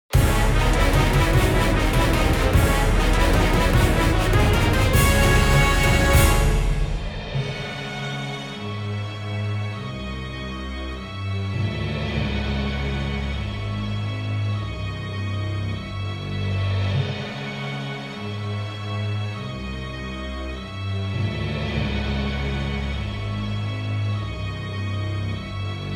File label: Defeat Fanfare